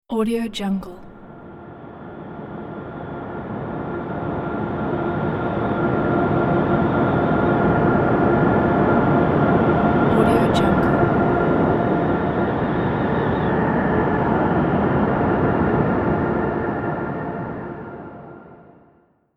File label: future wind; sonic-boom